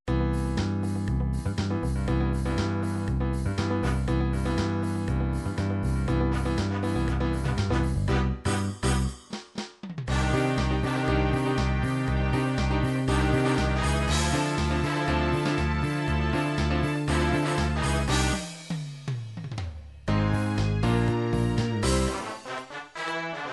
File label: disco, midi